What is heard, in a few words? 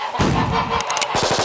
gun chicken